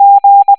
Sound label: g tone